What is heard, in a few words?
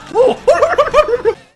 noise; vash